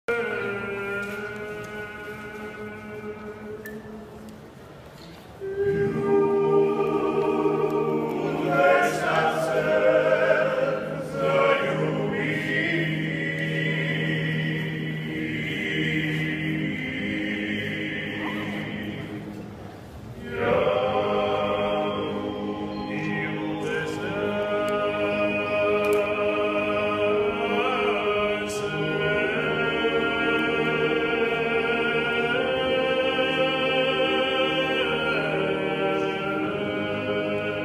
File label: Traditional Dalmatian Song Acapella